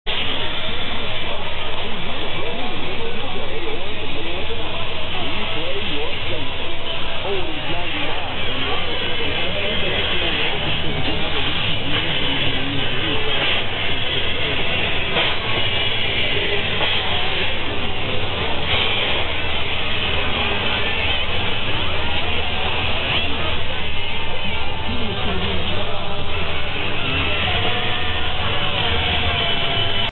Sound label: Oldies ID; 1550 unID Oldies 99